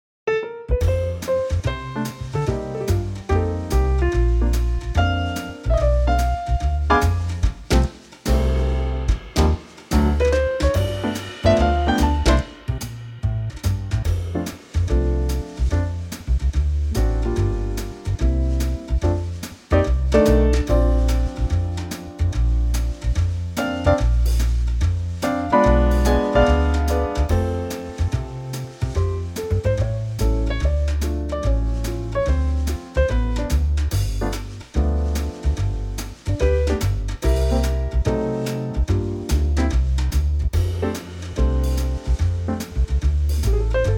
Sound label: F